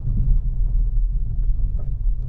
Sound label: Car Bump Over Railroa, hits & rattles